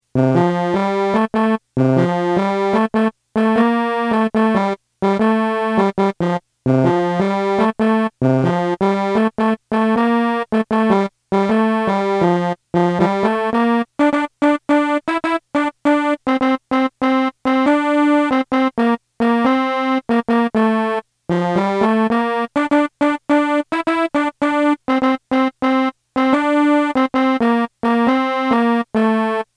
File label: melody